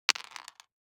DICE on Cardboard, Throw and Roll, Mini, 2 Two Dice; Samples; ArticulatedSounds; DICE Sfx Party (Extended)